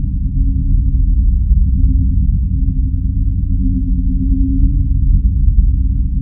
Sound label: rumble; trek